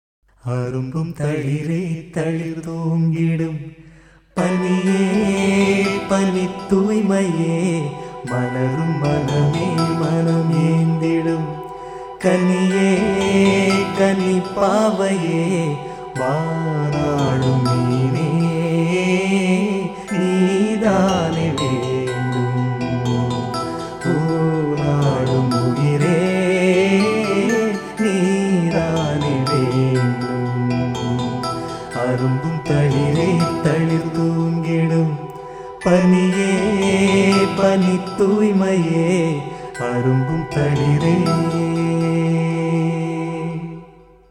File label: Solo